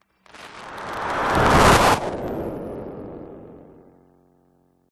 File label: Whoosh Electro Magnetic; PMSFX